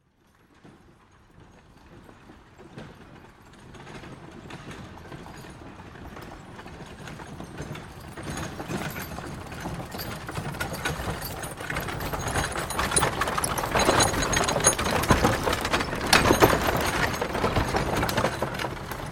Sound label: 2 Wagons 1st By And Stop 2nd, Horses Carriages and Traps; Hollywood Edge Historical Series